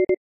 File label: collision; flight simulator